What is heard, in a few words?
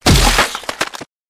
Large wood impact